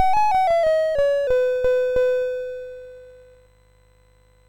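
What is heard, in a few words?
Mr Wiz, MOS 6581 SID chip music, games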